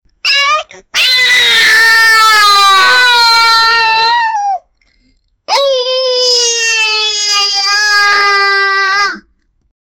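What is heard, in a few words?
create a little boy scared, boy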